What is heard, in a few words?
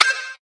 wup